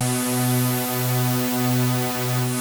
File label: KORG B3, Rare Synthesizer; Hollywood Edge - Giorgio Moroder Rare Synthesizer Collection